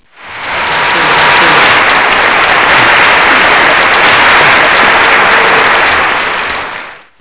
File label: applause; Spelling Made Easy